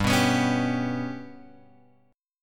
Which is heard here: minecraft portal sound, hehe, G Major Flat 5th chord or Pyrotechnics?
G Major Flat 5th chord